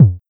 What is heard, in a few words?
Kick Acid